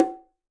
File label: Bongo L Open; Latin Percussion; Drums; Drum Hits; Ableton; Factory Packs